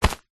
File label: foley bag nylon pe grab hand; zapsplat